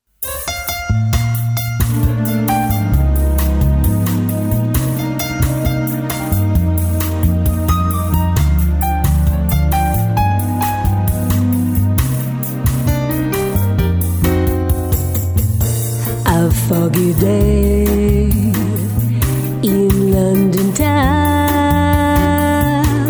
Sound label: Jazzy Latin